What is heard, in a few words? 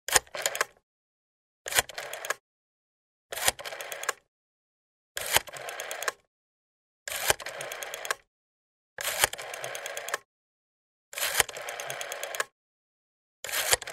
Dial a number on a rotary telephone